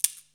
shaker; Zero-G; Total Drum Bass; Drums Percussion

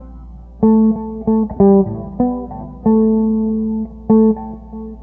Jazz Guitar; Sonic Foundry (Sony Creative Software); Stylus Pressure Urban Grooves on Digital Wax

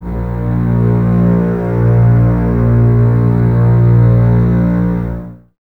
BSS A#1, String Ensembles, Basses; Lo+Top; Miroslav Vitous; 90 s Sample CDs

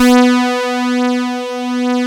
BASIC OB B3; E-MU Formula 4000 Series Vol. 3; Analog Odyssey

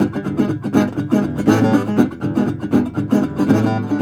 Blues Guitar Samples, riff; ILIO; Fretworks; SIMMS RIFF

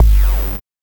BANK1 BD, NES DPCM; MIDINES

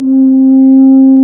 TUBA

